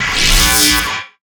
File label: zap